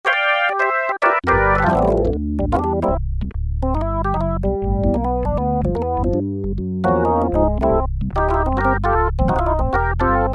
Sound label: Organ, Roland Juno-60; Factory presets